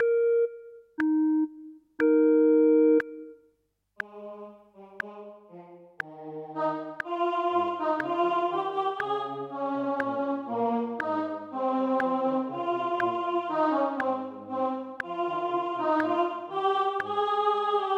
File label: O clap your hands; Gibbons